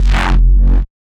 F- MidRange Organic

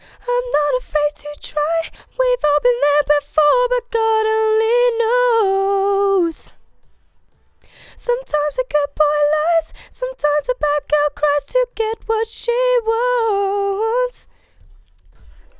Vox 123 G dry; BBC

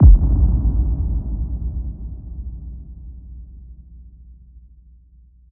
Fx Impact Crackle

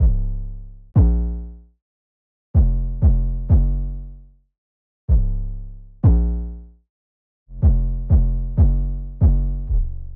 C 808

